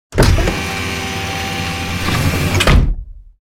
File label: Robotic Movement; SoundsCrate